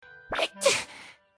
Cute Sneeze

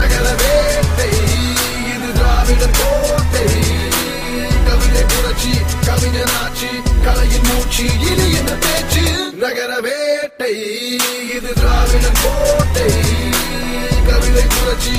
Tamil Rap

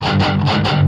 Heavy Message

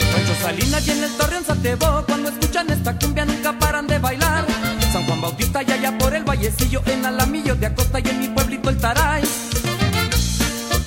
Cumbia Regional

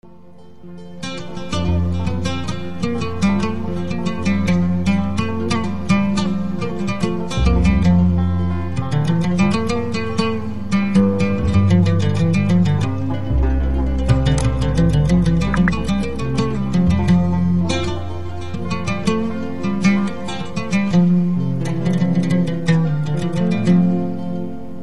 3oud

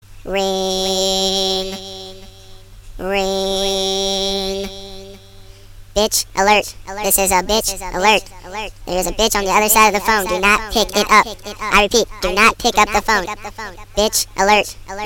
Echo Alert

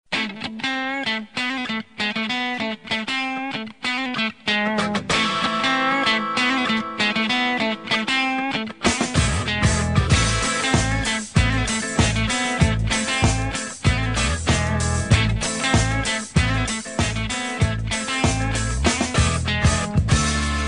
Funk Guitar

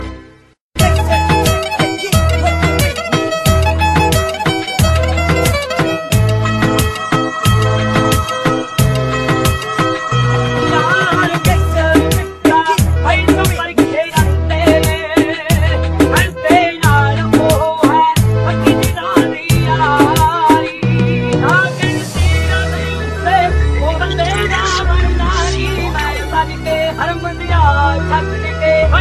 Tumbi Mix

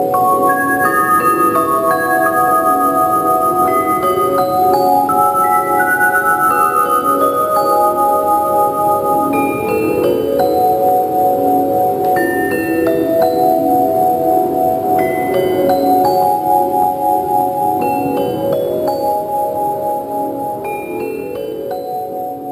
Music Box; Midnas

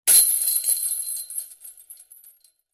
Disc golf Chains

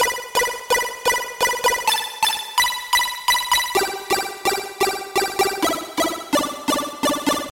Bleep Alarm

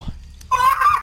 Amazing scream

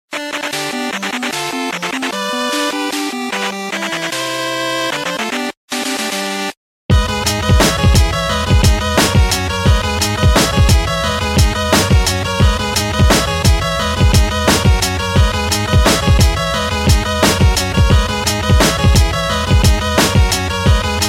8 Bit Remix; PunchOut

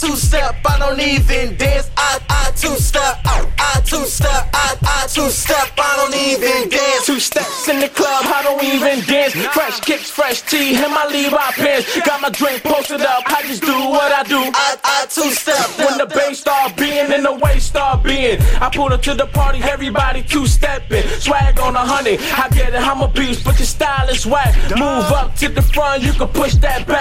2 Step

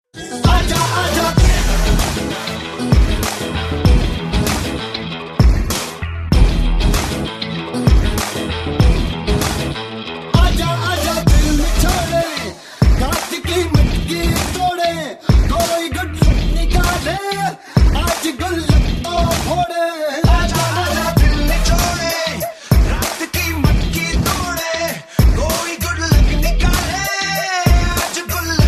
Hiphop

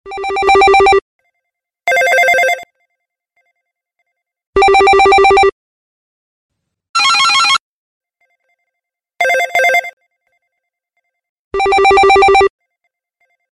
3d Classic Ring

